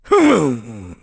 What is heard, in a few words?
Sumo Pose; Mayor Bo posing to start a sumo match